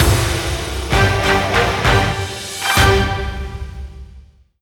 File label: win; Power Rangers vs Teenage Mutant Ninja Turtles - Ultimate Hero Clash 2